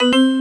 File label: max step; Amatic; Hot Neon